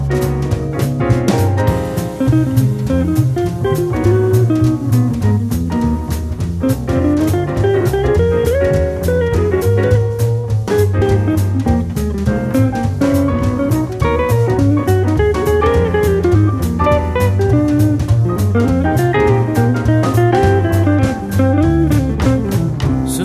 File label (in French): solo